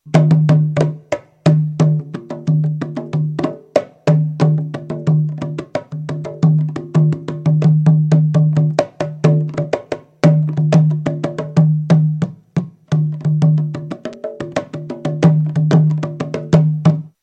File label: kendang; instruments